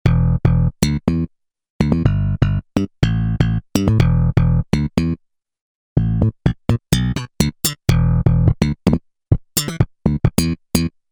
Thumpin Bass; Factory Patches

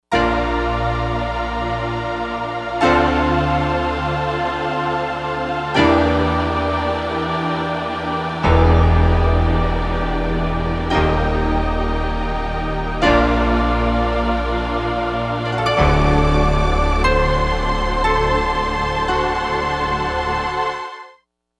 Piano Choir; Digital Synthesizer

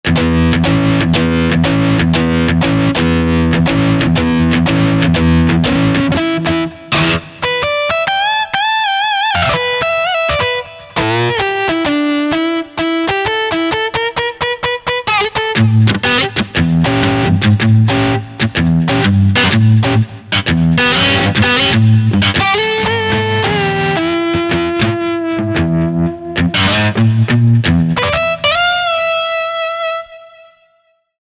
Blue Mutes

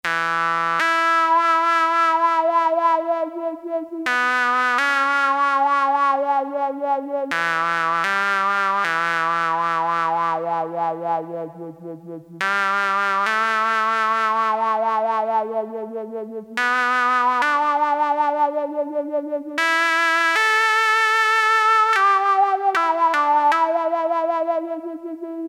Trumpet + wha wha, Farfisa Syntorchestra Mono

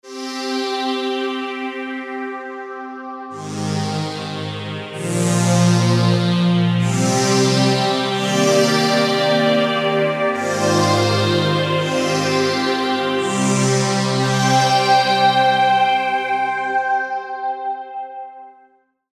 5th Sweep

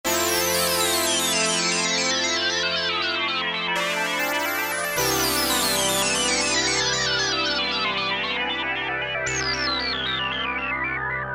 Random LFO Moving Pad, Roland JX-3P

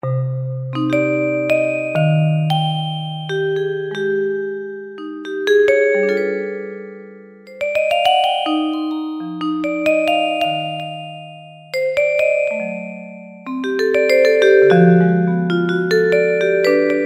Vibe; Yamaha EX5R; Factory presets

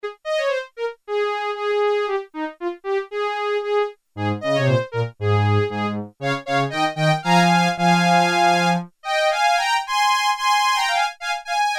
Bowed Violins, Korg Poly-61M

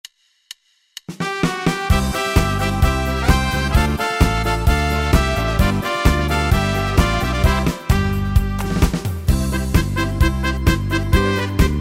Schlager Polka, Yamaha PSR-3000 Factory Styles; Entertainer

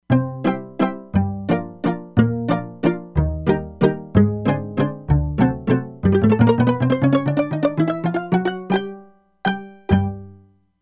Pizzicatos, E-mu Proteus-3; Factory patches

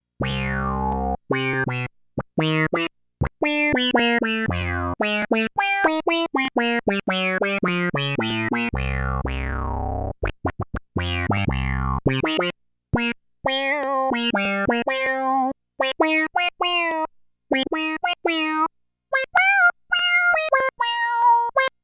Mellow Wow

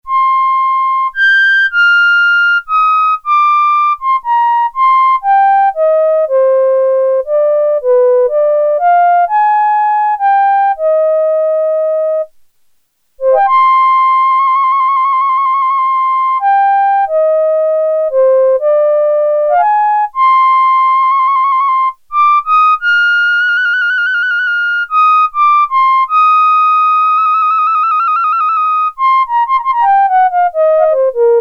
Flutes; Sequential Circuits Prophet-5 (Ver.3); Factory Patch

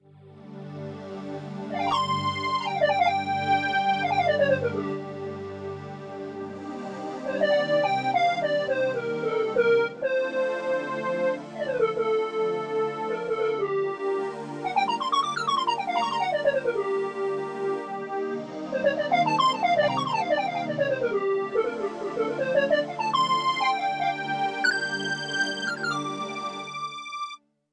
Breath Lead Split; Yamaha TX16W; Synth Set

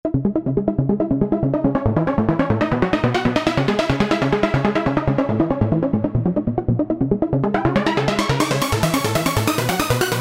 Arpstar, Roland V-Synth; Factory Patches

